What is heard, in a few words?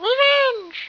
cute revenge, yells